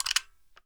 m24 load, sniper; weapons; garrysmod

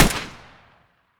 sr25 fire, sniper; weapons; garrysmod

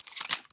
spas insert shell; weapons; garrysmod

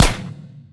alyx gun fire; weapons; garrysmod

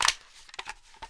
ak47 mag in; garrysmod

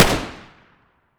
ots33 fire, pistol; garrysmod

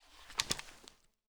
rem870 draw, shotgun; weapons; garrysmod

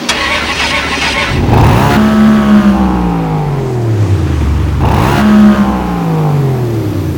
Start, vehicles Caterham; garrysmod

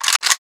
g36c cock; garrysmod

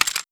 deagle l clip in, weapons; garrysmod; deagles

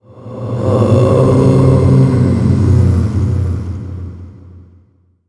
wraith death; garrysmod

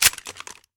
beretta m92 slide release; beretta92; garrysmod

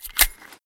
usp clip in, weapons; usp45; garrysmod

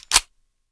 1911 slide stop, pistol m1911a1; garrysmod

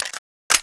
p90 mag in; garrysmod; p90 smg

